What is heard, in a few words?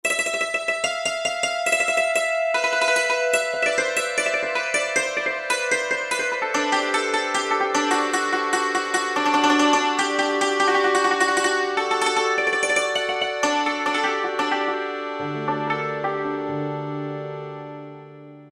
Cimbalom, Roland SR-JV80-05 World